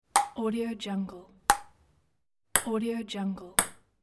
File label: ping pong ball hit